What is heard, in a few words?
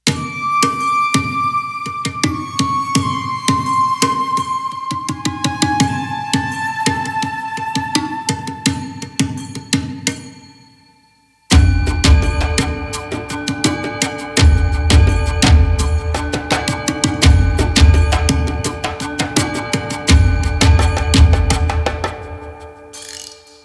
Ambient Day; Eidolon; Cetus